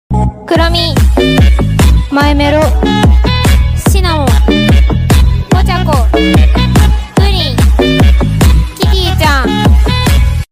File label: Melody; Kuromi